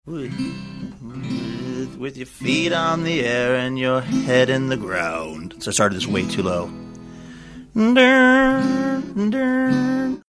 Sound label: strumming his guitar trying to sing song